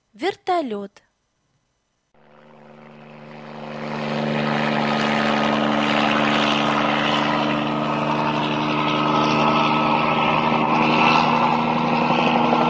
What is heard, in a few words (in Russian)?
Вертолет